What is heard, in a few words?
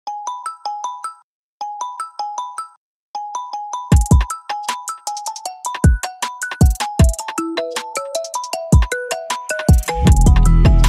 Xiaomi Redmi Ringtone